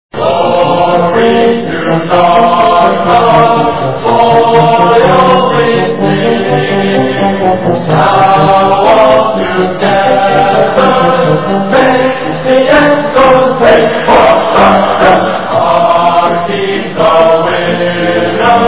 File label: singing; dartmouth